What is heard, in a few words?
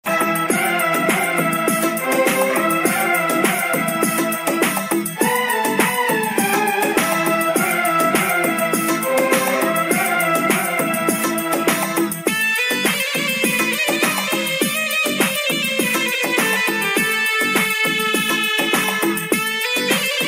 music ringtone